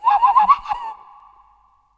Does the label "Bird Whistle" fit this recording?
Yes